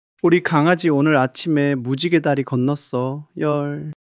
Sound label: crying